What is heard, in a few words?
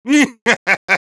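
laugh evil, Spanish; Heavy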